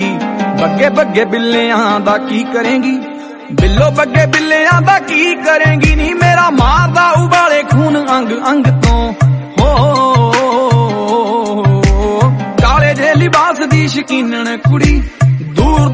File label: song ringtone